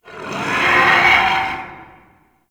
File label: Arcade Dino Laser Roar; Ambience; Props; Warframe; Nineteen Ninety Nine